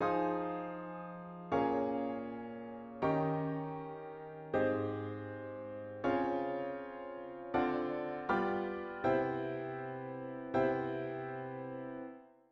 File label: chord